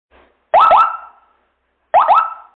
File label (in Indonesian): Sirine Polisi Ringtone